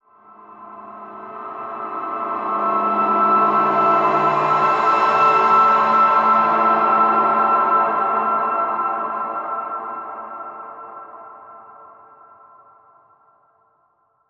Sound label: Horizon Light Transition